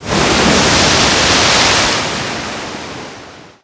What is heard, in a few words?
Waves Heavy, ambient; Totaled; XBOX